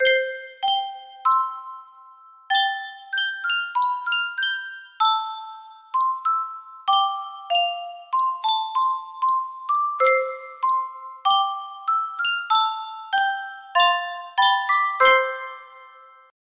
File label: music box; yunsheng custom tune